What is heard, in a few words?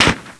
fmj hit; cstrike; codlife